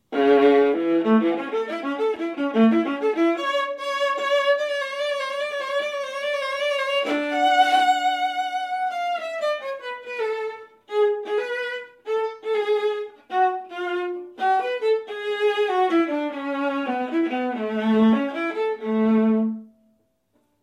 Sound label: Viola; Enesco